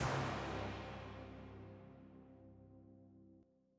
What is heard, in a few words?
Hit, Closed Hats